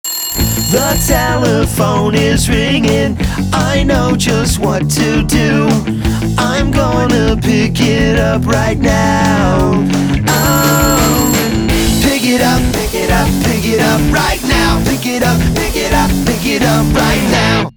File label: alt rock